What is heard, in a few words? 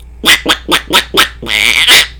quackity quack